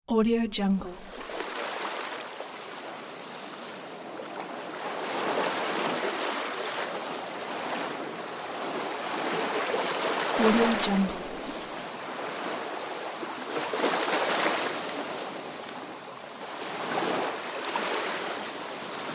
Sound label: ocean shore